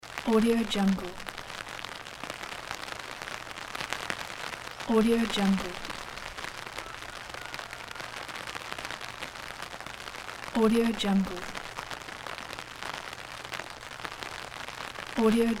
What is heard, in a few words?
rain on umbrella; r2d2lab